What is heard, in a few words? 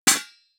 Impact Bowl Metal Hit By Copper Pole